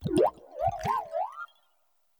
Grito de Dolliv

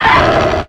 Grito de Lucario

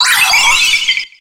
Grito de Azelf

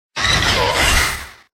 Grito de Melmetal

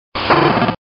Grito de Swinub

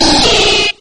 Grito de Aipom

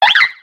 Grito de Sewaddle

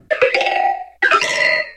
Grito de Pumpkaboo

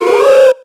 Grito de Hoothoot

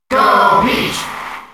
Grito del público; Peach; SSBM

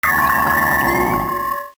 Grito de Bronzong

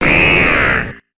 Grito de Ekans

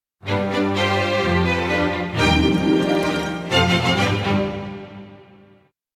Tema de victoria; Star Fox; SSBB

